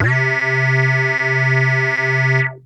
Techno leads, hits and riffs

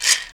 Ganza Soft

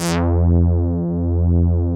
DRY BASS; Masterbits; Dance Trax

